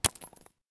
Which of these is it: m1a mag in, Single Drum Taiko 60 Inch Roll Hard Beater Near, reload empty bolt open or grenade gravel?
grenade gravel